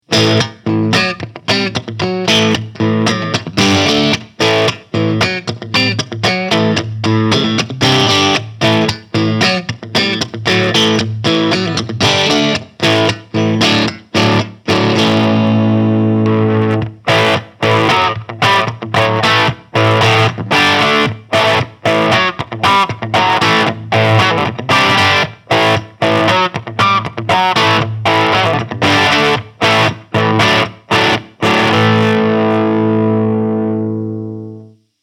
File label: Super Shredder Exotic FR Ziricote Light Crunch; Sun Valley